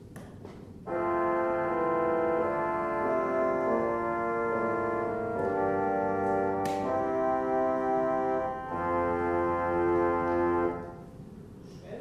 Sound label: Osmond